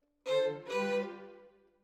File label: Violin Solo